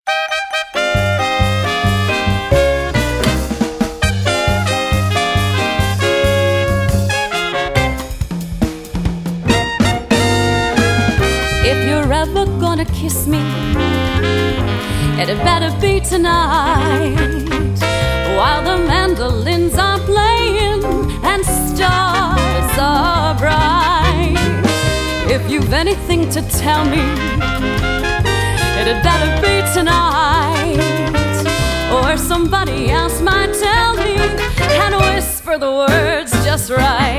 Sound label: Jazz Band; Seattle